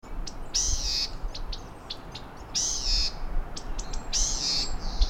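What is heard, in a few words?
squeak cry for help of a small bird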